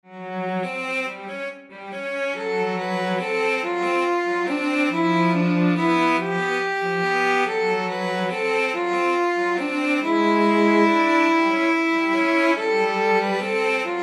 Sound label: Waltz